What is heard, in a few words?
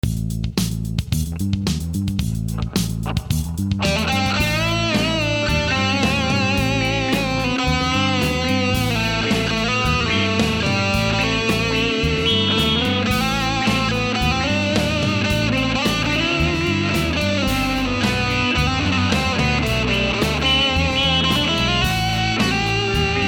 Clean (Squier Classic Vibe Tele 50’s)